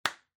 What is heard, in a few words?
Hand Foley Clap High